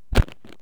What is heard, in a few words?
stickfighter attack; propack